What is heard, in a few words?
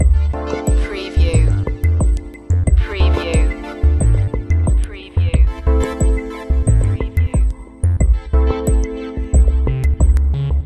clear goal loop